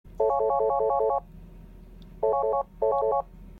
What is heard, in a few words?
Polycom Low Trill